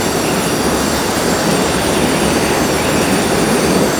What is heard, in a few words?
righteous gust loop; LoL; galio